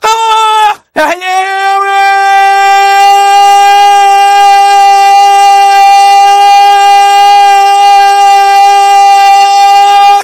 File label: scout triple jump